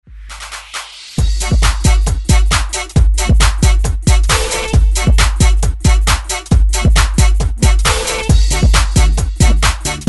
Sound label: 90s Dance Redrum